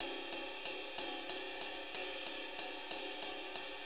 6-8 Ride, HiHat & Ride Loops

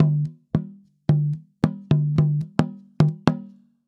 Tambora, African

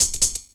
C HH, Drum & Bass, 140 BPM; Ueberschall; Drum & Bass Resonance

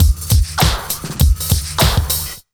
100 BEAT, Pure Electro

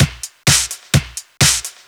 Straight Loop 128 BPM, House

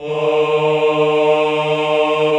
CHO, Men-Combi; Sound & Vision - Gigapack I CD 2 (Roland)

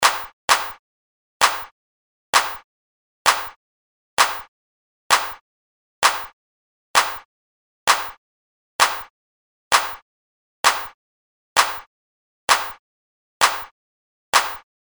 One Man Clap; Industrial

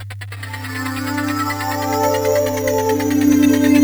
opening atoms C 125, synth loop; Zero-G; Soundclash; Nu Dance Electro

